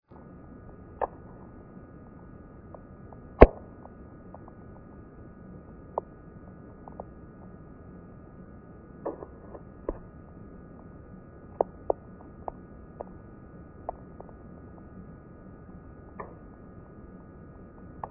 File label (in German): Feld Hinten ATM VLF slow, Natural Radio